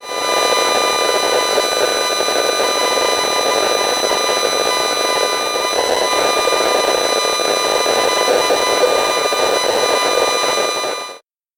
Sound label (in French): Antenne relais telephonie mobile echantillon; Antenne relais téléphonie mobile - échantillon 3; Ondes électromagnétiques et électromagnétisme